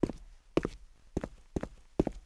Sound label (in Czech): kroky; Mafie 1